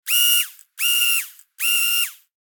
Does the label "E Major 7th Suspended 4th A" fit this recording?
No